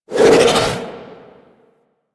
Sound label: Melee Charge Windup; Warframe